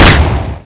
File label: smg